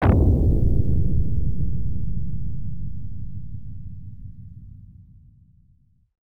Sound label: Opening Sound Effect; Xak -The Art of Visual Stage; X68000